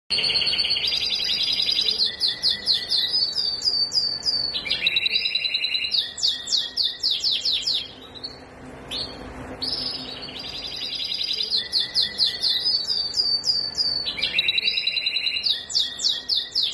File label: Canary, Bird Songs